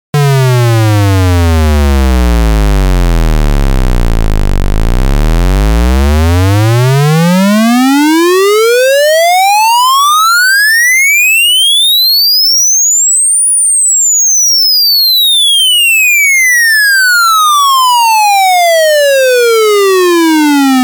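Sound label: Oscillator Block LFO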